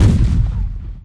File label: Medium Cannon; Warzone